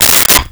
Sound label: Saucers Sliding, Kitchen